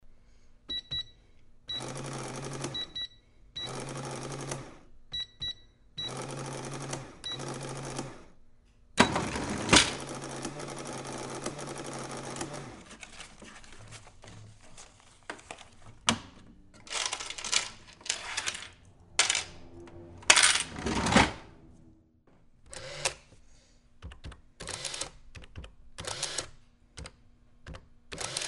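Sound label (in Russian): пробивают товар касса открывается сыплются деньги и пробивается счет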